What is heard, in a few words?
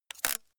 ratch rattle